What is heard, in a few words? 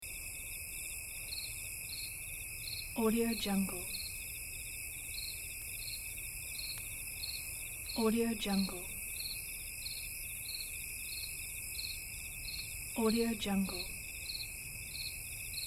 crickets